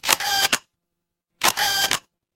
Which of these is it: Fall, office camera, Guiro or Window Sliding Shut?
office camera